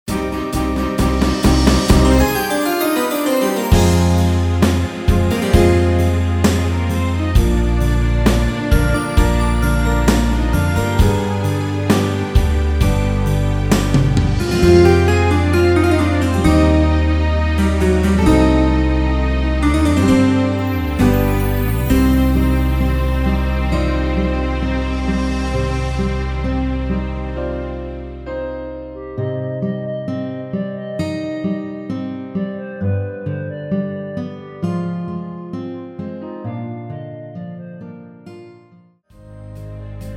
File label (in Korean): (-1)(멜로디 MR)